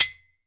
SPOKE HHF, SPOKE HI HAT

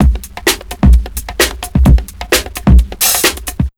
JMP 130 LP, Big Beat Drumloops; JUMP 130

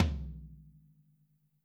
Drums Percussion

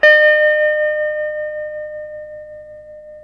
TELE TREM E, Guitars, TREMO; Spectrasonics; Hans Zimmer Guitars VOL-1; TREMO-LUXURY

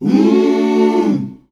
Mmm, sung phrases; Voices Of Africa

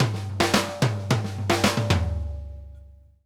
drum fills 108; Sampleheads; New York City Drumworks VOL-1

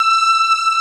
HI STRING D, JUPITER 8; Greytsounds VOL-1

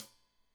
Hat Tight, Hi-Hat, Cymbals; Deeply Sampled Drums

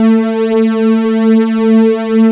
MIDI pad, synth pad

